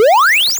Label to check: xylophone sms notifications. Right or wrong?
Wrong